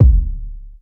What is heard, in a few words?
long bass drum single hit F sharp key